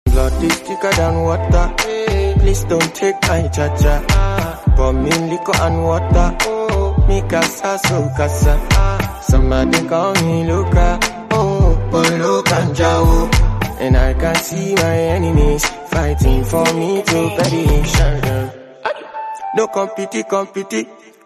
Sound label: Instrumental Hook